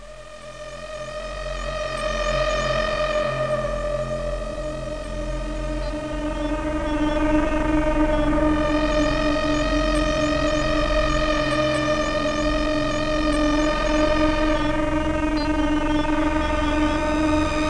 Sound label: sci-fi; MusicScapes Professional; Wayzata Technology